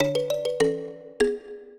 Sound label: minuet, mbira; Mozart